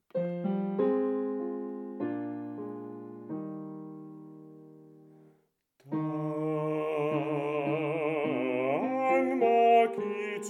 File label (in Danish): hymne tenor